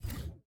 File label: Hand Paddle Release Extra Light